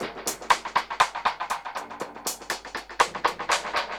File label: Dub Drums B Echo Kit 120bpm; musicradar